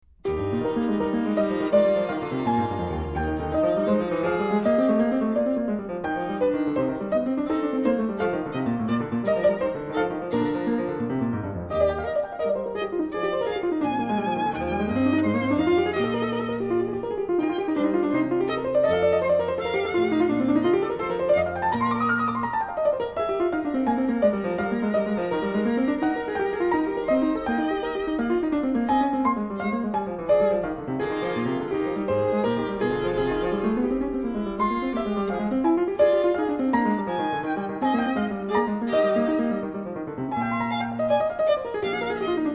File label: Violin Sonata in E flat, Variazione V; Mozart; K481